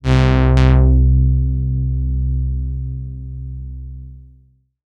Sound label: BASS